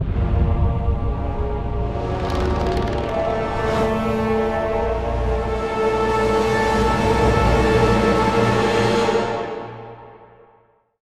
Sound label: harvest fail